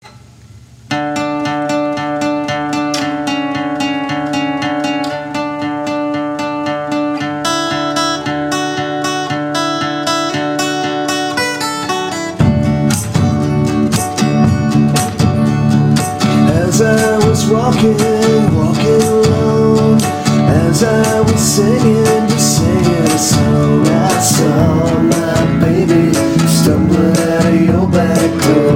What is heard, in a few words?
Blues